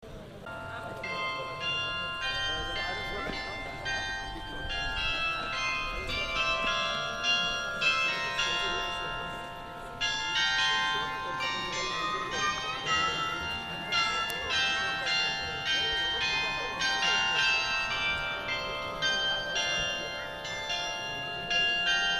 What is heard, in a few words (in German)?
Glockenspiel